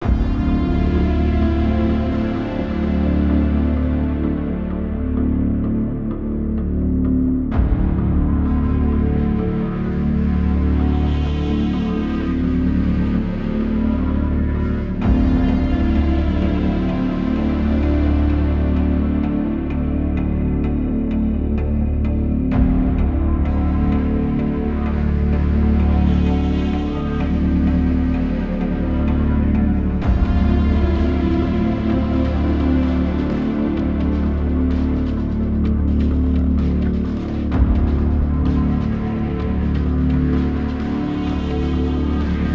Ambient Forest Mist; Ambient Music Pack Vol. 6

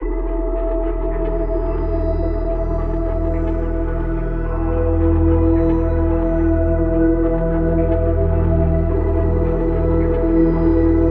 Elec Ambient; Electronic Ambient Music Pack

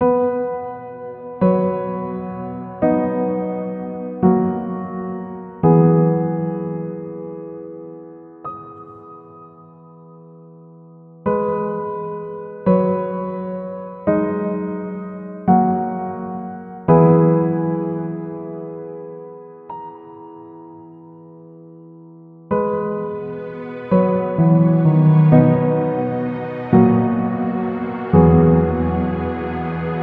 Ambient; Ambient Music Pack Vol. 6

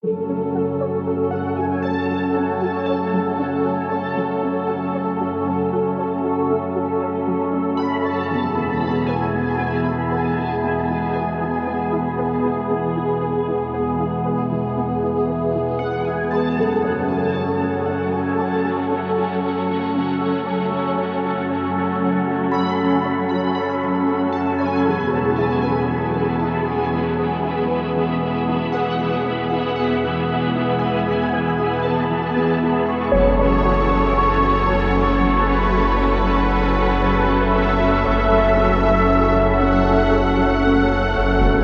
Electronic Ambient; Electronic Ambient Music Pack

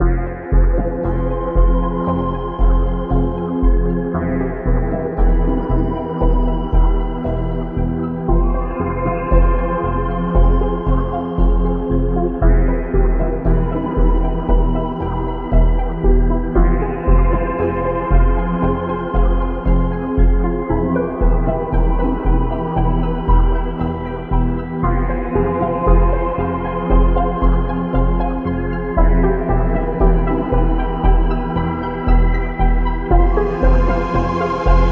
Electronic Ambient Swarm; Electronic Ambient Music Pack

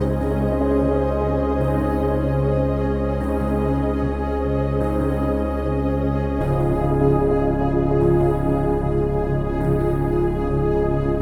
Ethereal Heartbeat; Ethereal Music Pack

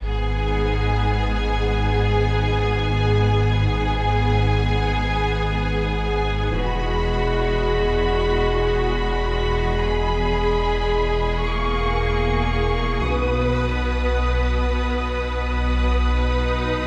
Orch Ambient; Orchestral Ambient Music Pack

